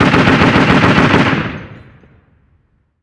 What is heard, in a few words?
gun 50cal med; amb elements; modern warfare 2